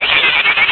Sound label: Burn; getting burned (3rd sound); Link getting burned (3rd sound); zelda